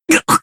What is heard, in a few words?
gasp